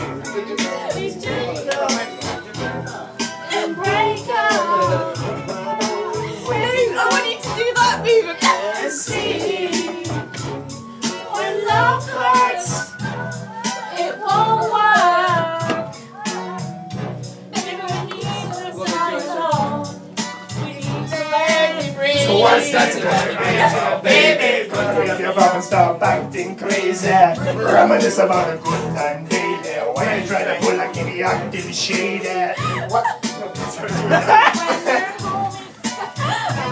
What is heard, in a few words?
karaoke ragga; raggaboo